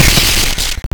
Glitch screech

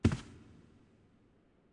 Footstep Fabric; LavaMaker458

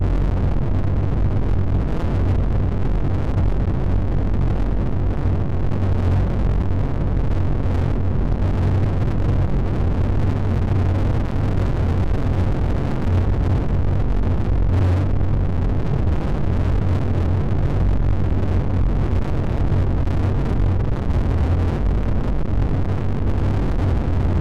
rumble; A-60

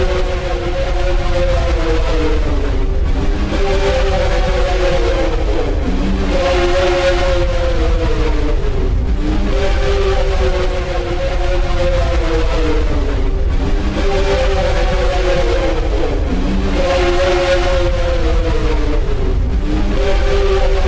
loser ambience; Plays during Haste's chase. Recorded from in-game audio.

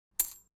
Gold Pickup

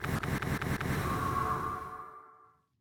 Candle Wet

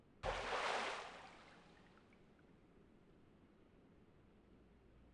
Footstep Water

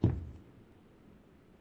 Footstep Marble

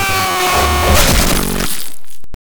Glitchy hit faster

